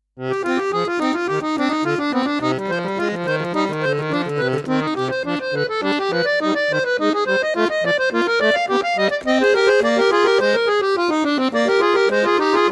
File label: Preludium c minor; J S Bach